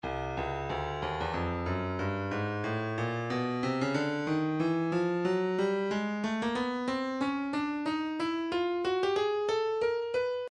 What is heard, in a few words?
breakbeat midi files 092 093 bpm; Stylus